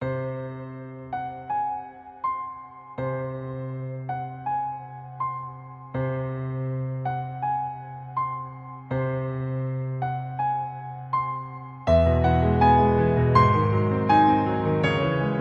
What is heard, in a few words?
Piano Cover